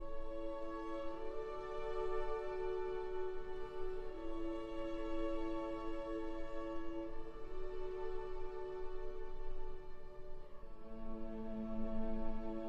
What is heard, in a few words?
for cello and strings